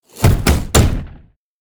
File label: golem angry; LoL; monsters